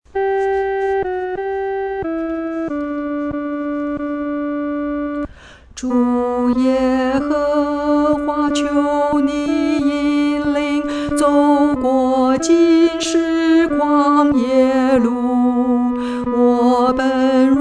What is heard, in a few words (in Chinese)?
独唱（第二声）